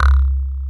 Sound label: Bass Analog & Synth, C2; CRAPOW; USB Soundscan vol.12; AKAI; VINTAGE